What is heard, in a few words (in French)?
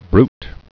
bruit